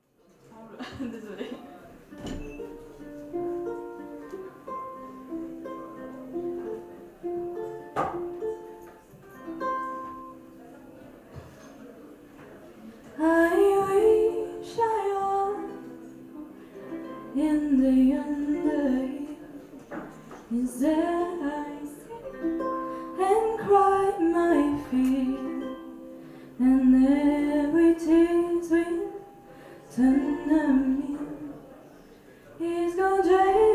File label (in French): Chanson en Gaelic, ukulele